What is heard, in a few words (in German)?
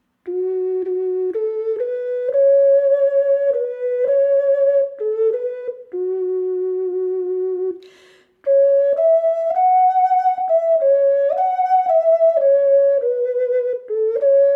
Indianisch Fis, ocarina; Indianische Ocarina